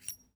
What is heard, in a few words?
raging bull remove